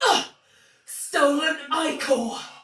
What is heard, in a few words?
Pain, Soldier Female, Battle; Dialog; Barks; Sinecure